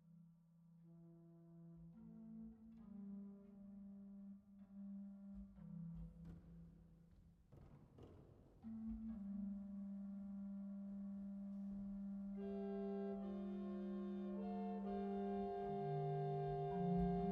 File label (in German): Tiefes Register